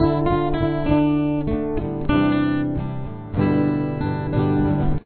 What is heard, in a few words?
chorus